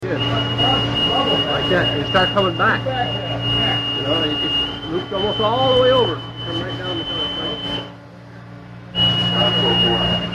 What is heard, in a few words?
bosun's pipe